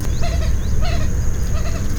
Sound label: giddy-up; National Marsh Bird